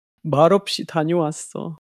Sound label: crying